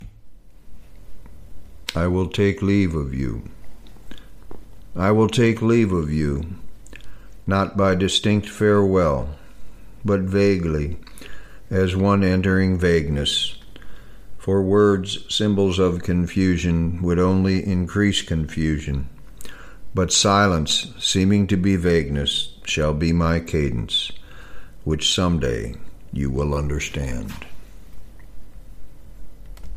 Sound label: Carillon; I Will Take Leave of You